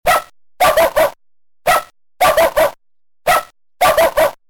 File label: bark raw; optidog